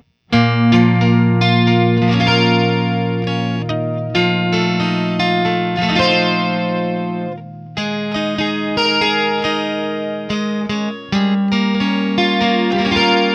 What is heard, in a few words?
Guild Crossroads Doubleneck 12 ODS100; 12-string; Guild Slash Crossroads Doubleneck